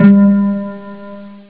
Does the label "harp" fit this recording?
Yes